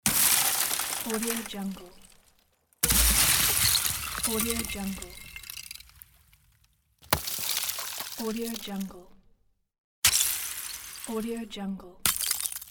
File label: ice hit pack